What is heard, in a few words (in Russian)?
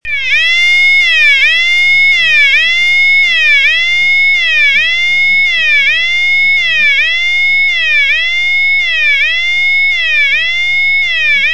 sirena